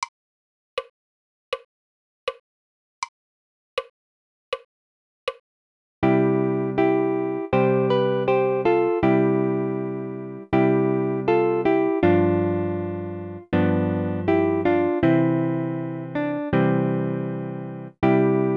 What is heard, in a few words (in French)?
quatuor; quatuor du lundi